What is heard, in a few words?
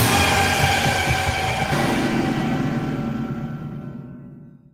jumpscare; granny